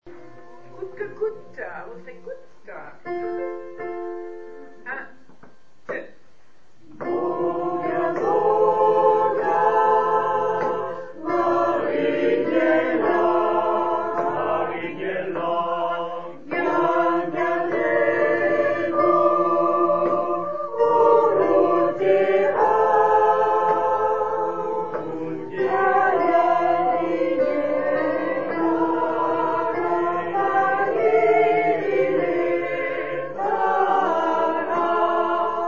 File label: tutti